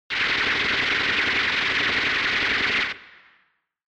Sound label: Retro Anime Gun Long